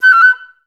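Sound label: flutes F#5; Best Service; Peter Siedlaczek's Advanced Orchestra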